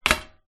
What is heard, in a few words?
household metal incense burner place down